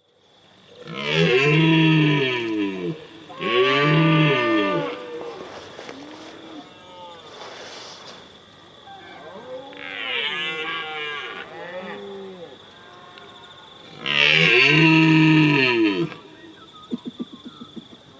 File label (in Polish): Odglosy zwierzat JELEN; Odgłosy zwierząt